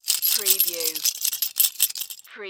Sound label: Handcuffs Chain Shake